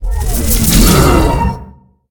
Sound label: healing spell; firefight